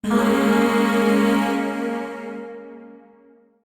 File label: Void Shawzin Phrygian Chord